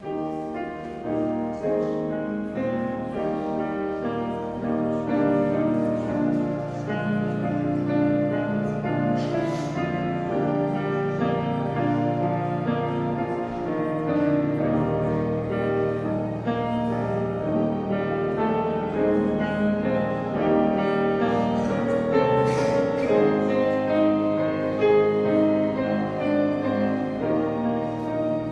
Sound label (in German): Vorspiel